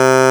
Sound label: svox, Digital Synths; Ableton Foundation FreePacks